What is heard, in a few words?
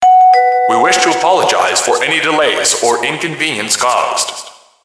subway